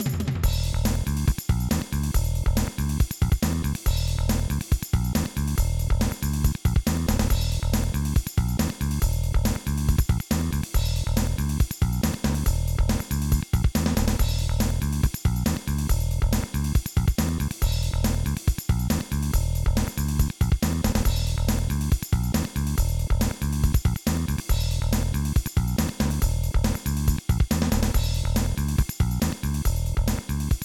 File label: Bass; hot shots golf; playstation